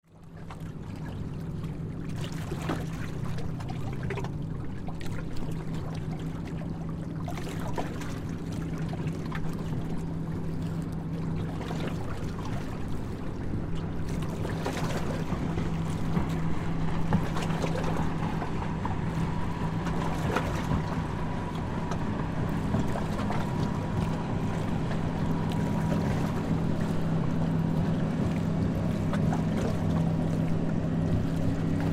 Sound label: Wake Splash Against Dock Distant Boat; Water; Blastwave